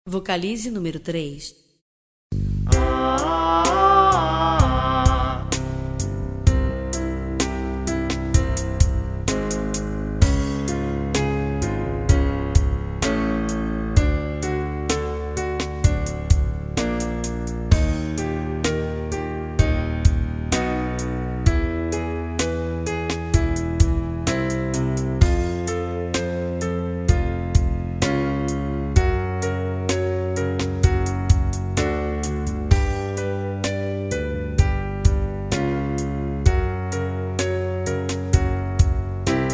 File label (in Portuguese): sem voz, vocalizes canto; exercicios canto